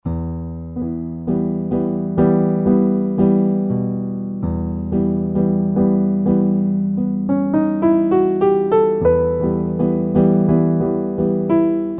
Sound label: Roland JV-2080 Classique